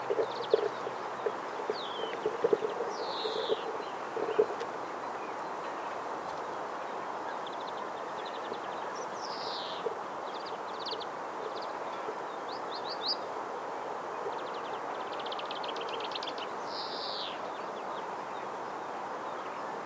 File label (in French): verdier d europe